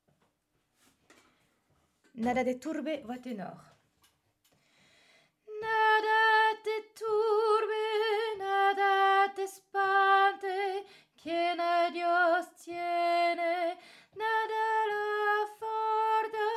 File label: Tenor